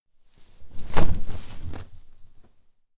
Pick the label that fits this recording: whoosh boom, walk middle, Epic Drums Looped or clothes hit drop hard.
clothes hit drop hard